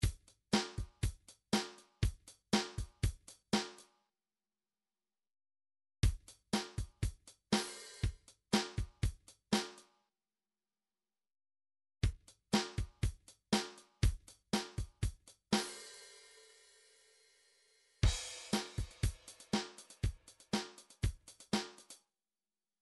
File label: midi drums; Bruford Midi Drums